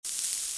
fire; grubs

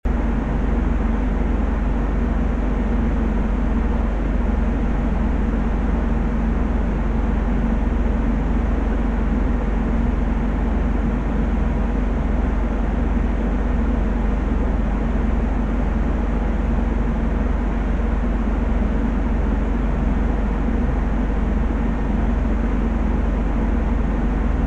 inside ambience loop; ambient

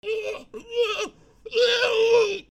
scream, zombie screams

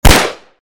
pistol fire; dlfortwars

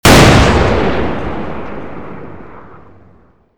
explosion; swb; explosives; jailbreak

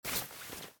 parachute deploy; weapons; grubs

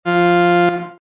organ start tone; punt

